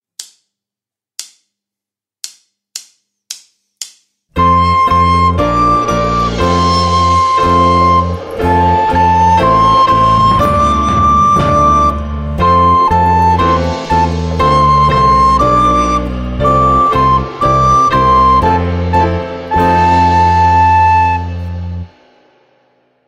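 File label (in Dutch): met blokfluit snel; Vliegerlied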